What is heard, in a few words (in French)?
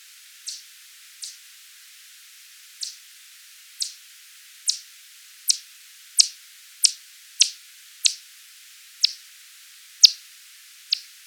claq bas abs bas M nattereri; Alpes-Maritimes